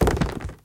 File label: Trash Bin Used; Atelier